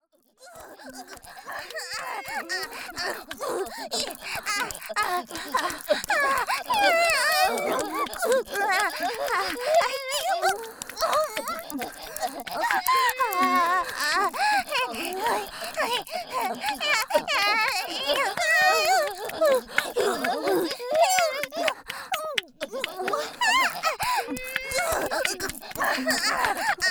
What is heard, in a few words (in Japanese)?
乱交喘ぎ／主人公ボイスあり